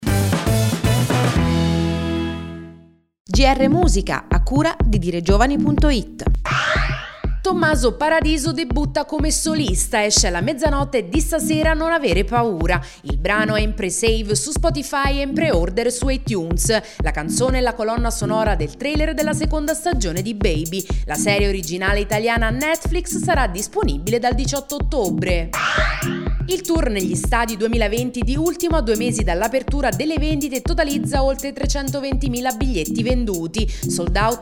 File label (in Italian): musica